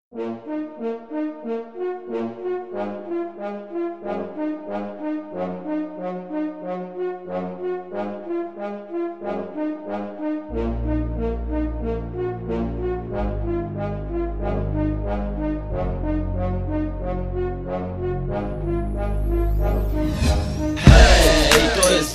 dobro wolny styl 432 hz

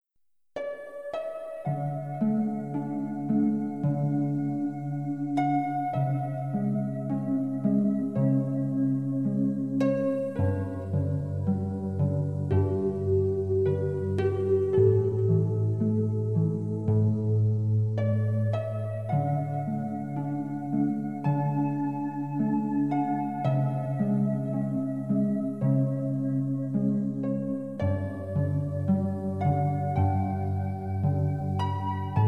muzyka aniolow, 432 Hz

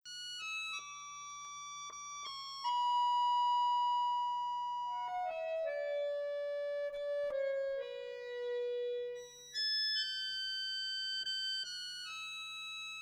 duet akordeonowy 432 hz

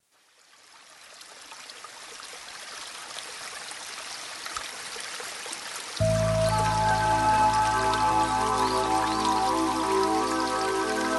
relaks przy strumyku melodia harmonii

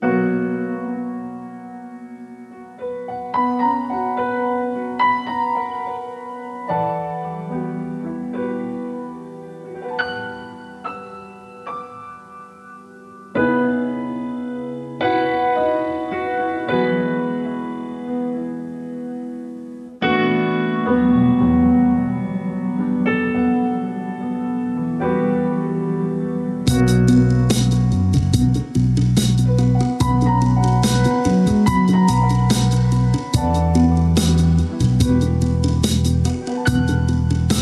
Zbiór utworów w klimacie funk, chill; Rhythmical Funk Vibes

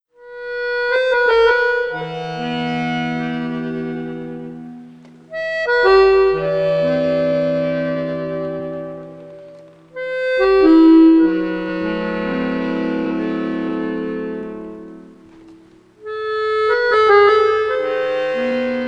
duet akordeonowy 432 hz

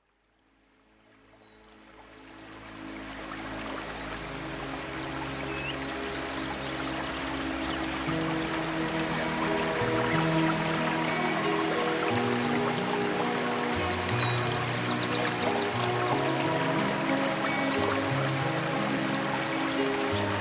górski potok bicie serca 432 hz

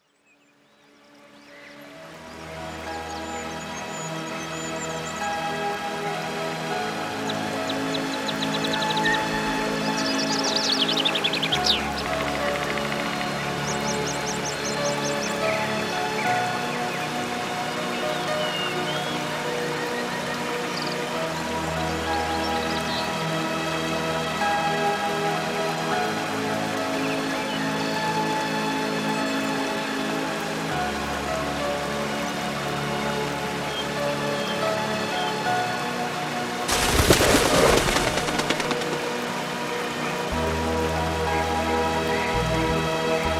gorski potok lesny spacer 432 hz

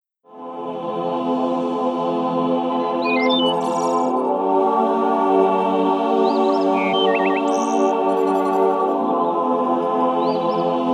muzyczny miks relaksacyjny, 432 hz; na skraju

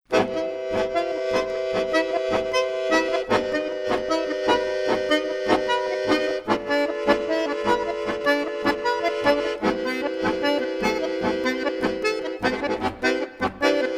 duet akordeonowy, 432 hz

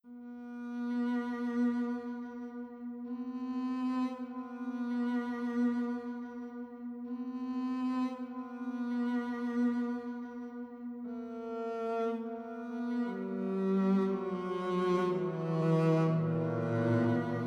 pozytywka 432 hz

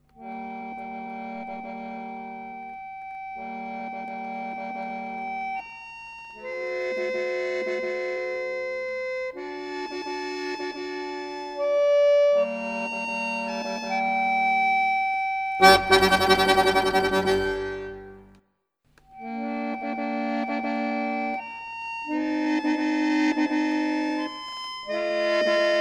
duet akordeonowy 432 hz; vivaldi summer

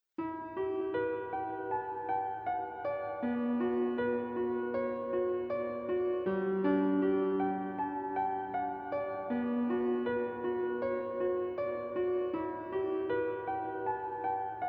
pozytywka 432 hz

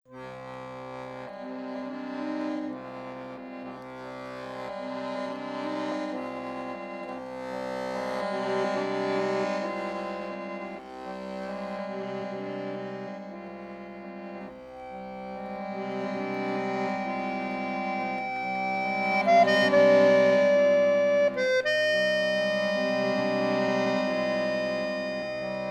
duet akordeonowy, 432 hz

